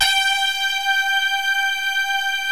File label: MYSTERY SYNTH